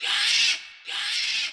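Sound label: squawk, dactyl; Giants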